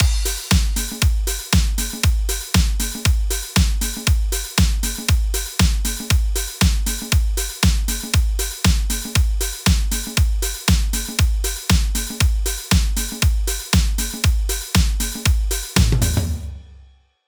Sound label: electro swing beat only